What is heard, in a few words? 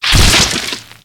Eyeball Splat; Ruins